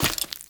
脚步声; 沼骸

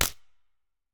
嘎枝冻结声

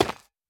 远古残骸破坏声

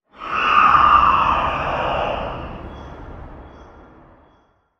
幽匿尖啸体尖啸声

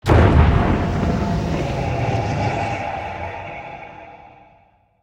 末地传送门开启声

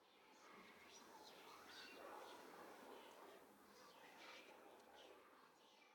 灵魂沙峡谷低语声; ambient

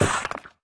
切石机切割声

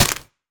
红树根踩踏声

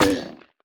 海龟受伤声

